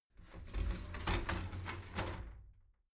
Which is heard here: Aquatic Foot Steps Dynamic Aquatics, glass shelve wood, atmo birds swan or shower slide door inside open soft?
shower slide door inside open soft